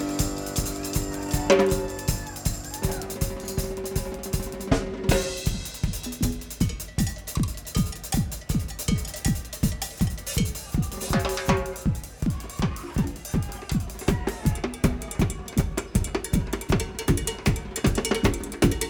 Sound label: Drums; The Vogue Theater Indianapolis IN USA